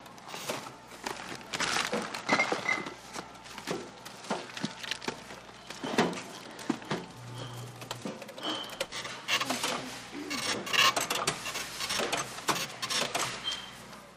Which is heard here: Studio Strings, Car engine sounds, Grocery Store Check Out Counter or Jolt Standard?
Grocery Store Check Out Counter